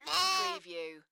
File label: Lamb bleating; beanstalk audio